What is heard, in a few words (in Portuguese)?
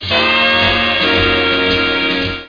charme; 7invasores